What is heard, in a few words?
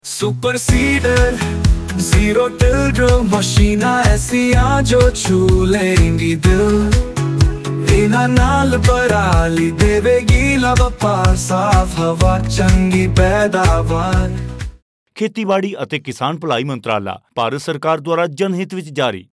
punjabi, jingles